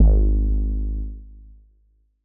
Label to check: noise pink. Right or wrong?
Wrong